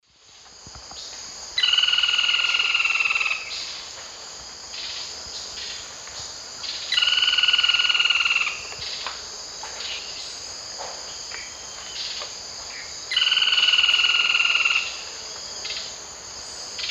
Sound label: Automolus infuscatus, song; S. America; Eastern Lowlands